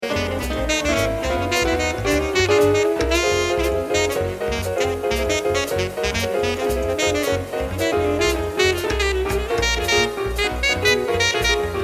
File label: Jazz tenor sax; Level: Advanced; Tenor Sax or Soprano Sax